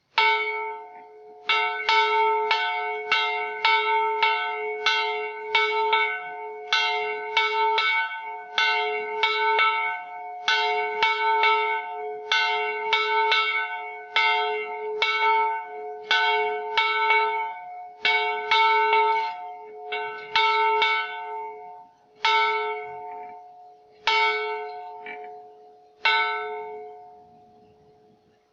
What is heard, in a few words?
Bell